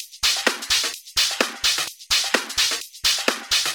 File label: Minimal Loops